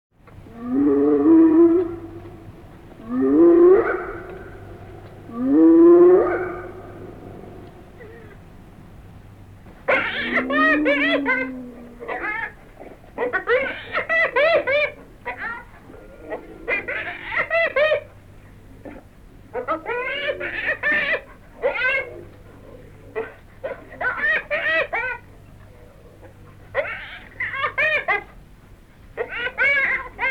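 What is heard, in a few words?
hyena sounds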